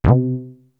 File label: LAZER BASS; East West - Global Sounds; PROC.BASS